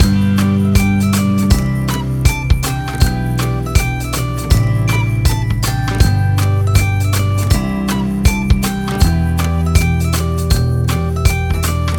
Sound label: Light Pop Loop